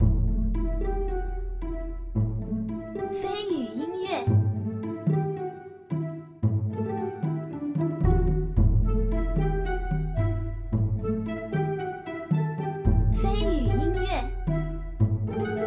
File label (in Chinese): 背景音乐